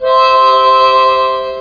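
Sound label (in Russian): губная гармоника радостный аккорд вибрато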